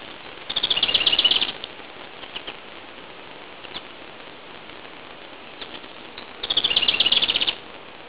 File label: bird unknown